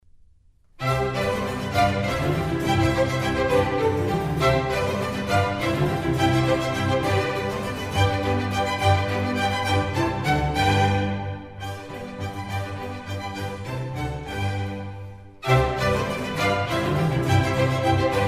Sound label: Concerto for Strings in C major; RV110; Antonio Vivaldi